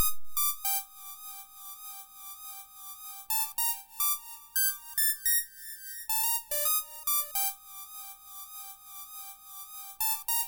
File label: sid MOS6581R4